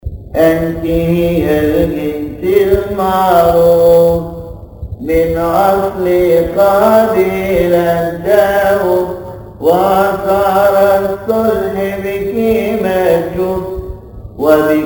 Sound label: Arabic Praise; Anti Heya; Kiahk; شهر كيهك; تسبحة عشية; مكتبة الألحان; كنيسة الشهيد العظيم مارجرجس بنزلة السمان